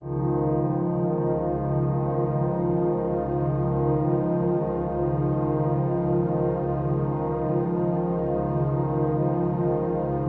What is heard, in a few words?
Overlay Drone